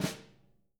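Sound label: jazz snares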